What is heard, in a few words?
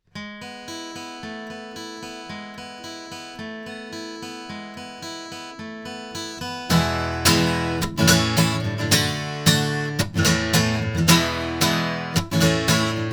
Oktava MK012 LOMO M3 guitar